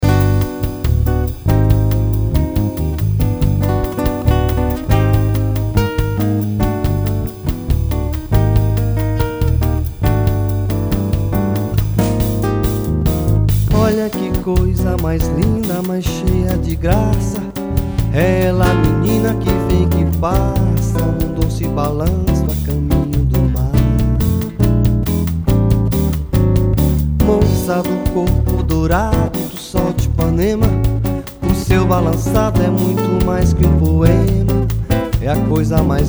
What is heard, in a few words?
Brazilian Jazz Samba